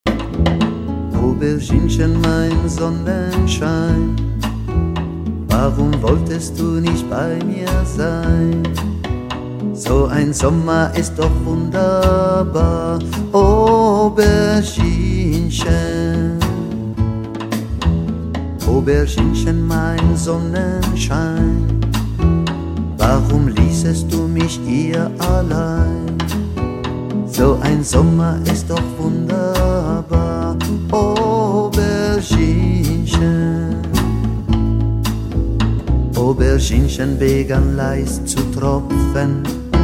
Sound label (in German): Deutsch Gesang